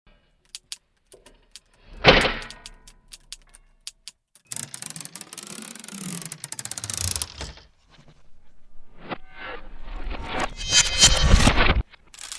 typewriter bent

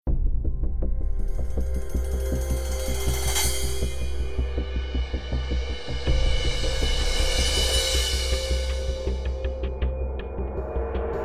swell natural

